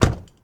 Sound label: Truck Ford250 Door Close; Trucks